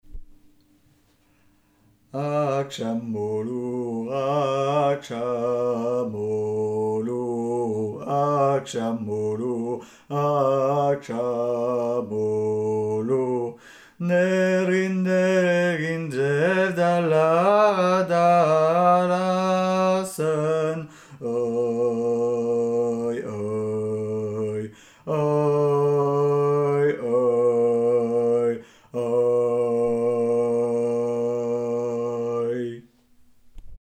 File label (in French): alto; Aksham olur